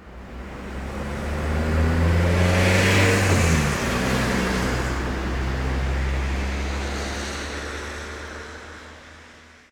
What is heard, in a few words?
car driveby, street traffic; best service Blue Box